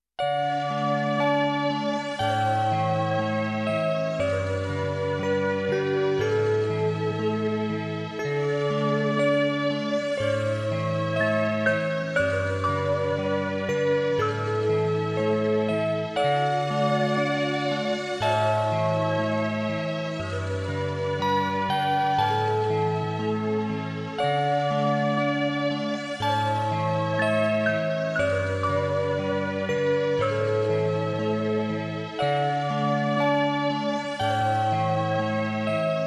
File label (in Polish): muzyka do masazu 432 hz